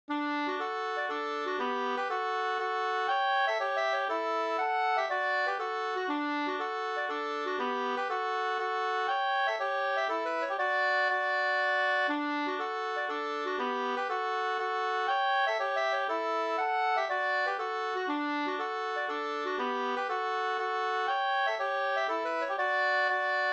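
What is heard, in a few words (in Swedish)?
polska Glotelaten Hälsingland 2 stämmor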